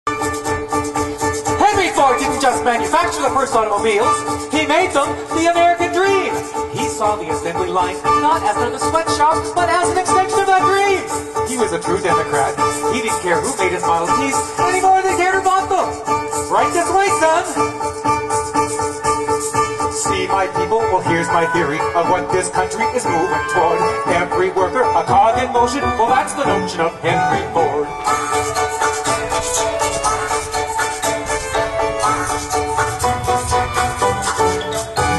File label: ragtime